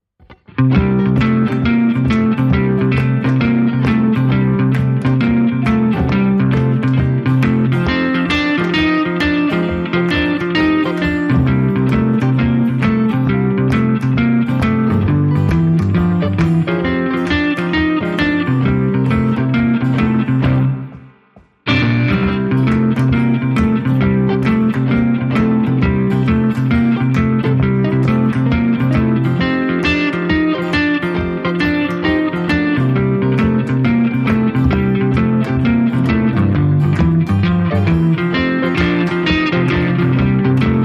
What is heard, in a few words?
Guitar Boogie